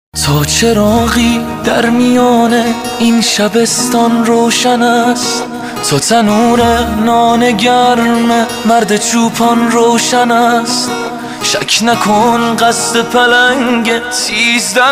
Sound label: ringtone